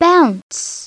bounce

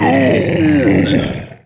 morm die; DAoC

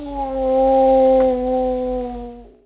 MOAN; Blade of Darkness

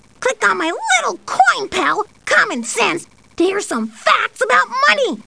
TIMER

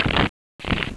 Shuffle, Cards; Mike's Cards

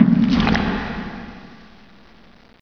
HIT; Terra; TERACIDE

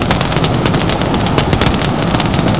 burning; starship trooper; environment; Nejlepší České Hry

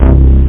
FUNKY SYN

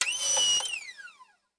CLEAN, industrial

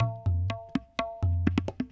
PERC LOOP ETHNO 125

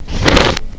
unscroll; Sports Illustrated Kids Sports Encyclopedia; Creative Multimedia 1994

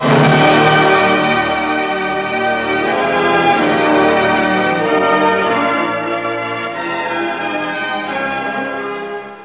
music second theme; First Knight

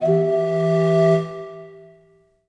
success

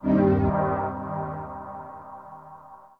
SYNTHPAD AMBNT 160 C; eJay; Ambient; Sphere

